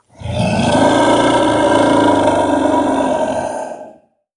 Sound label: angry dragon growl